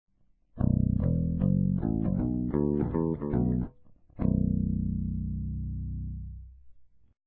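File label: bridge pickup pick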